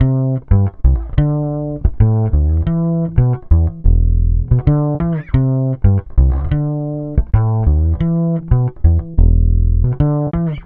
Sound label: Vintage Fretless, Electric Bass; Sonic Foundry (Sony Creative Software); Leo Cavallo Bass Taster